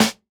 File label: Snare, jungle breaks drum sounds